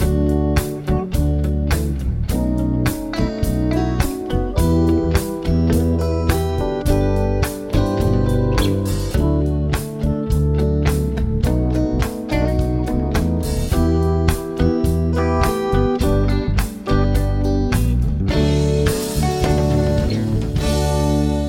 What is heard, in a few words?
progs with applied chords